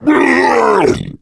male boomer spot prey, voice, attack; Left 4 Dead 2

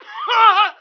normal dying; Emperor - Battle for Dune

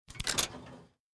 browse skins; League of Legends; lol air client

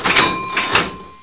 money; AssaultCube; Alien Stars; American Muscle Series

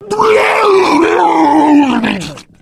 male boomer vomit; voice; Left 4 Dead 2

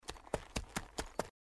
Horse; Age of Empires III

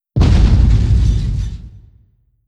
airplane bomb

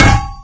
metal canister impact hard; physics; Garry's Mod; hl2

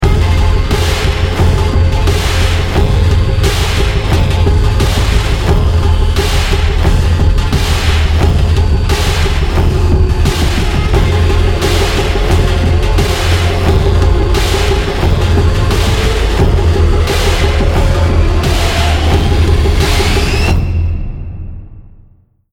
choose team, music

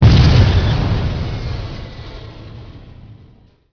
explosion medium; Emperor - Battle for Dune

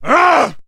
man yell; Mount&Blade Warband

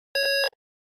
alarm; AssaultCube

